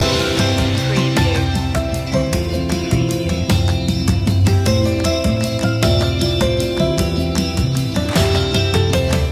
shining through loop

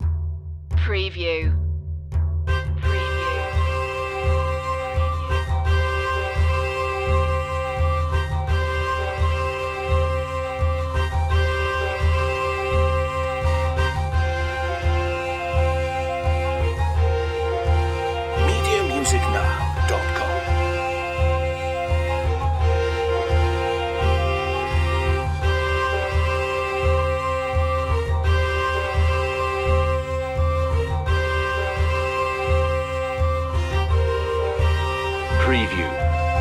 medieval dance

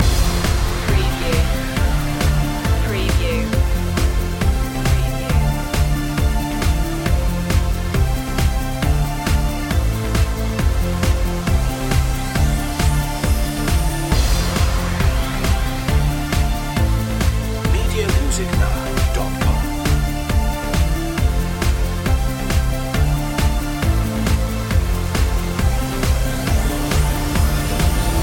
hands held high loop

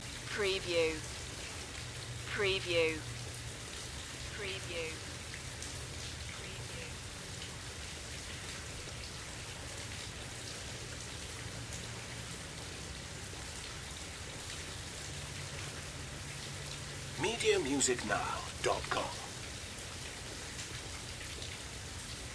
Medium Rain Loop

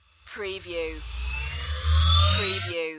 Short Synth Rise

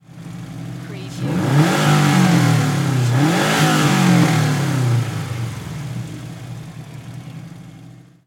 SUBARU STI ENGINE DOUBLE REV

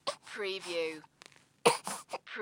Baby Fuss Short; sound effects bible

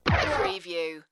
Punch

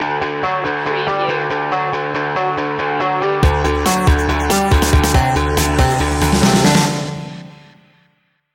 power up stinger

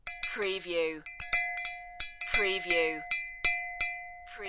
Cowbell

